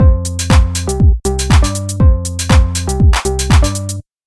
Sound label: Hi hats After